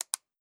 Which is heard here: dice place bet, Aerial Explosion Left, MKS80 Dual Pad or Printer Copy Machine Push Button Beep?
dice place bet